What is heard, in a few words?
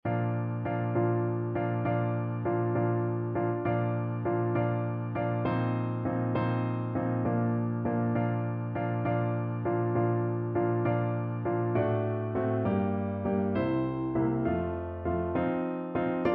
Sixths Medium Voice accompaniment; Grade 5